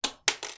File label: pin needle drop on table; sound effects one